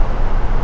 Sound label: vent; Mafie 1